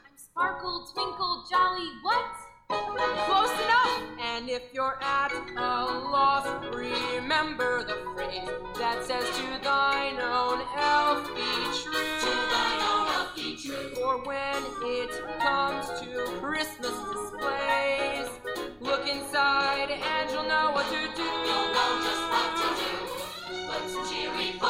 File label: Vocal Audition Track